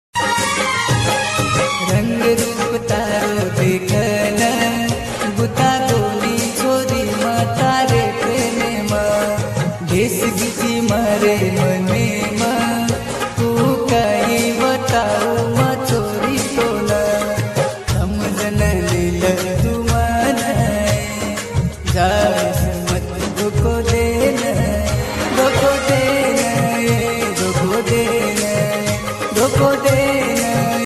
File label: ringtone, love ringtone